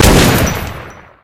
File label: abzac shoot, weapon